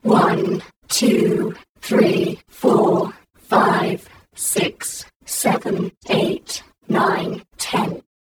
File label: count chorus; IAM sounddesign